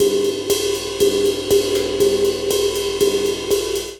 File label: EFX Cymbals; Drum Kits; Ableton; Drum Booth